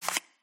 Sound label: card slide